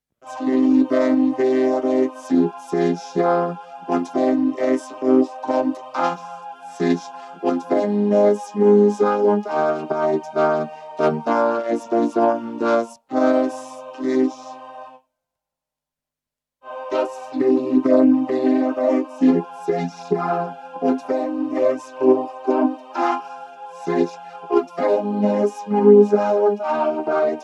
Vp330 Vocoder; Das Leben waehret 70 Jahre

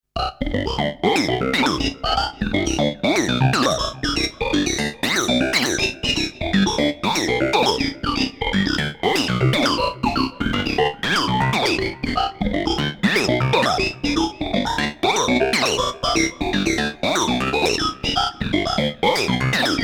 Talking Sequence; ASM Hydrasynth

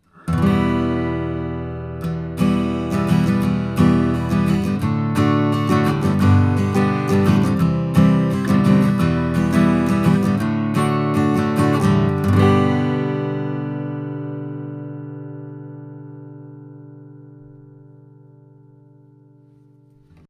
Oktava MK 115 Acoustic Guitar 40cm 15th Fret

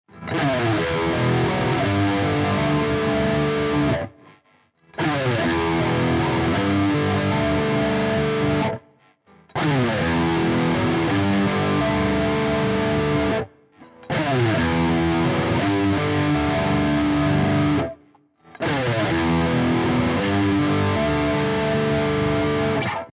AxisFlanger lead all on 12 PreDelay 5 Settings

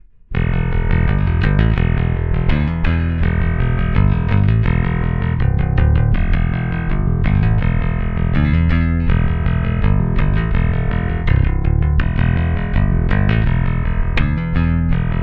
Neck active pick

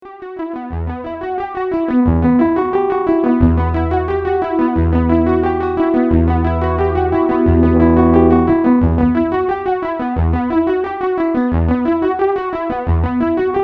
Juno 60 Arp MODULATED CHORUS; LXP-5

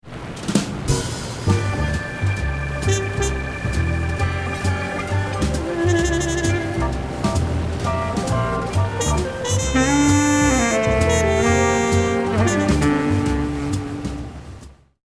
Jazz Noisy

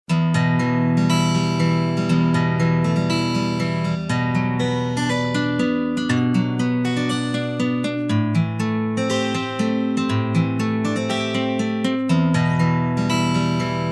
western pick

